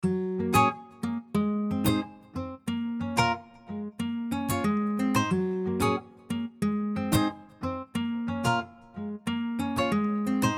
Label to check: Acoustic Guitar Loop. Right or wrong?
Right